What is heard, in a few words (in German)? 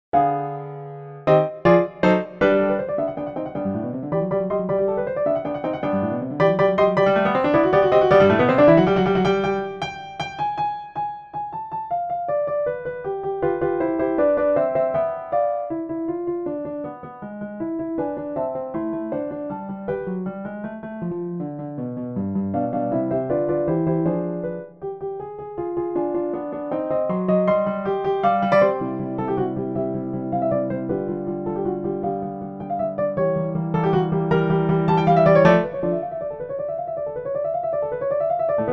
kurze Piano Solo